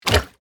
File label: Aquatic water game menu ui tab switch